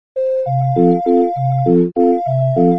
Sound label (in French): melodie note doublee; fioritures